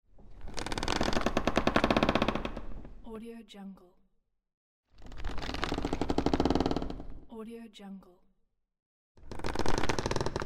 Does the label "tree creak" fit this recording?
Yes